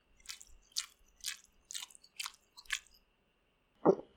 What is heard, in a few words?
Item Eat Chewy